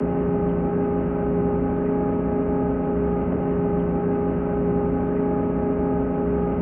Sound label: loop, Train cl158; OpenBVE